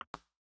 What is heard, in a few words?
button click; puzzle blocks